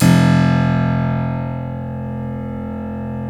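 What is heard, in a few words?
F0 clavinet left, Keyboards Acoustic; USB Soundscan vol.17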